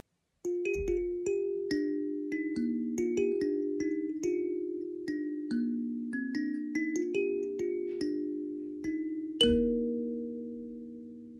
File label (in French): piano pouce